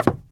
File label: shelter step; Original TK Sounds